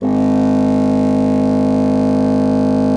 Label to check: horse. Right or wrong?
Wrong